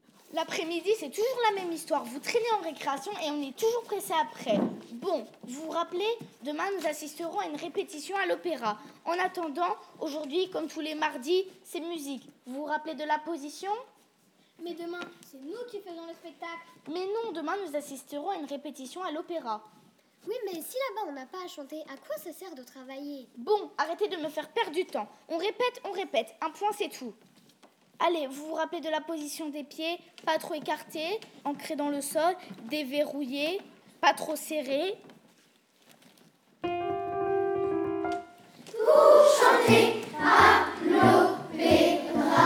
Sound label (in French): Chant Pour chanter a lopera; sentier musical berlioz